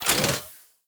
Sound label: Mech Move Foley; Nechro